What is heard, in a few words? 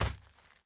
dirt land; teardown ps5 windows xbox series xs gamerip 2022